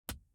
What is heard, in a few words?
ir back left ambix; Impulse Responses; Hyundai